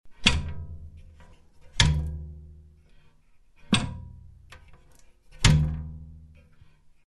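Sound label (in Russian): звуки дверей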